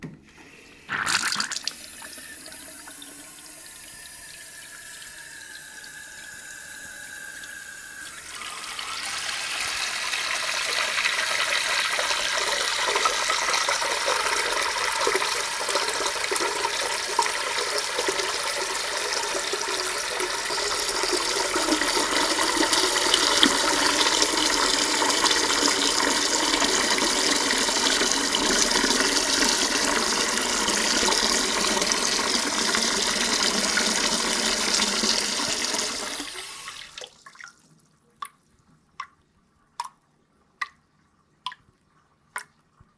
sink; NS Studios - ultra sound collection

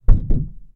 roof body impacts; Blind Drive

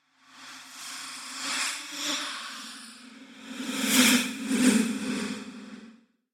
Whoosh By Flying Female Ghost - Long Hover; Hollywood Edge; sound designer's toolkit v2

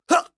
histeric short; donnie; Blind Drive

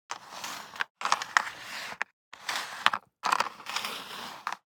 DICE on Cardboard, Slide, Standard, 4 Four Dice; Samples; ArticulatedSounds; DICE Sfx Party (Extended)

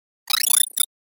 Button Tone Short Sci-Fi Creak; Hollywood Edge; sound designer's toolkit v2

